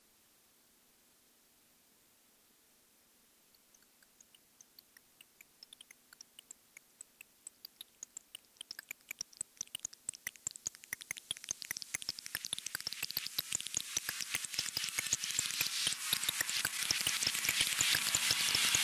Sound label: Rain; emgmusic